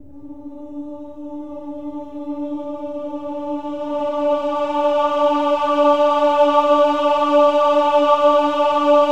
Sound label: OH-AH D4, choir; Spectrasonics; Symphony of Voices